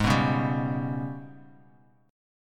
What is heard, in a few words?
Ab Minor Major 7th Double Flat 5th, down, Bass Standard; AbmM7bb5; strummed